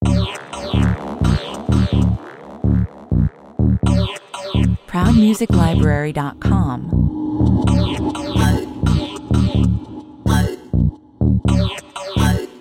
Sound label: sand surfer (bed, full mix) 126 bpm